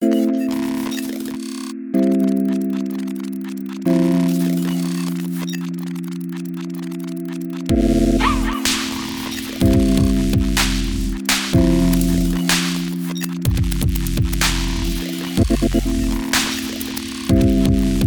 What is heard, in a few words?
crunk